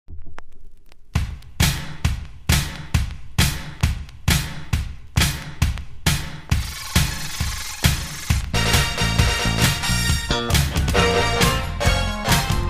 Rare Funk

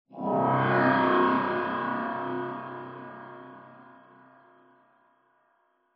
strider distant; c17

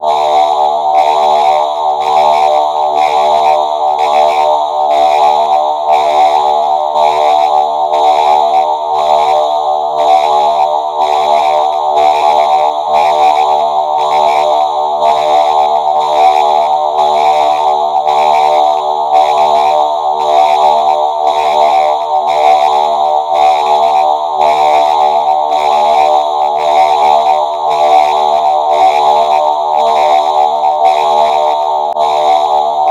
sci fi warning alert

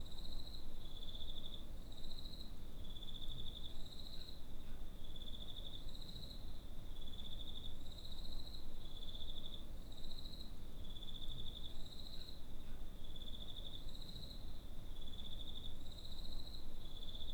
ambience crickets at night; messyacousticapocalypse666

